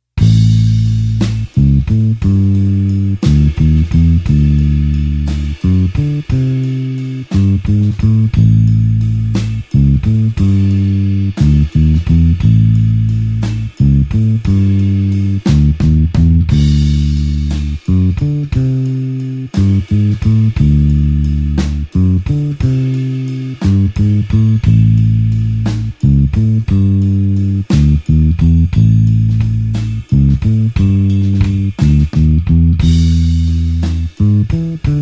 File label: blues bassline slow A